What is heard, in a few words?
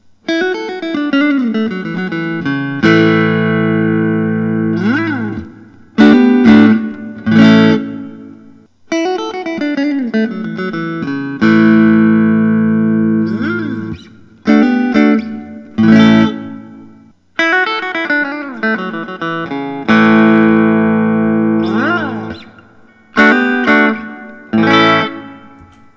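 fender custom shop 50s telecaster thinline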